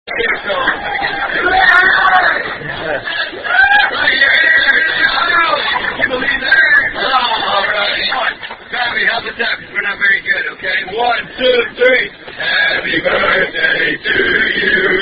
Outback Happy Birthday (Standard) Sung By Wait Staff